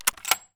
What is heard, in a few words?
bolt out; Escape from Tarkov